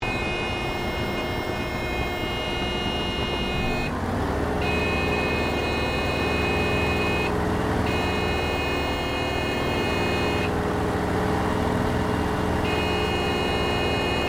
AIRCRAFT SUPERMARINE SPITFIRE MK-XVI FLYING INTERIOR STEREO; Airplanes; Sound Effects:Vehicles